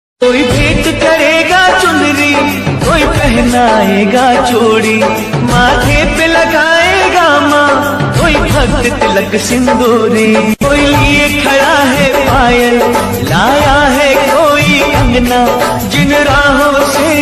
navratri ringtone maa durga ringtone bhakti ringtone